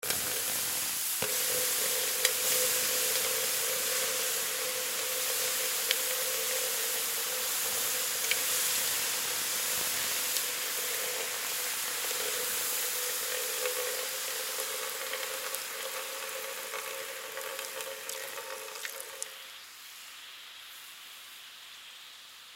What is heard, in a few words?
Grill Pour Water, Grill Pour Water Sizzle Cook Restaurant; Food Prep; Industry